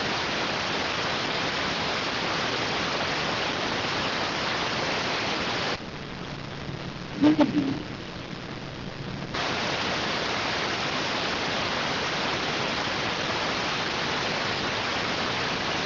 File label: rtty rx